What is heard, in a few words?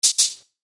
Chime Percussion; Multimedia Web Interactive; Hits (Sound Effects:Musical)